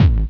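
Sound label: Distorted bass drum, roland mc-303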